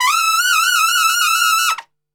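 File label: E trumpet shake; Best Service; Brass Super Section; TRP SHAKE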